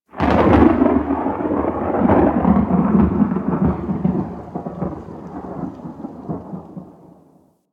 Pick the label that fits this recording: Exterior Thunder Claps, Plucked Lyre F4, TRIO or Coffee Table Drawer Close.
Exterior Thunder Claps